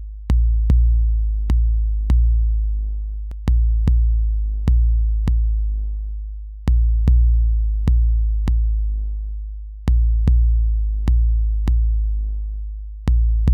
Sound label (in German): BD Uebersteuerung; Waldorf CMP1; RMS Attack 0 7 Release 4.7 Bleed 100